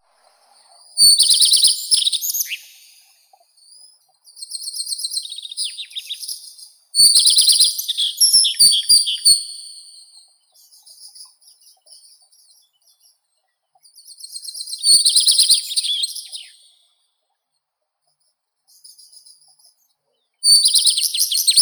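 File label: common redstart, Phoenicurus phoenicurus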